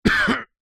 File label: human male 38 years old cough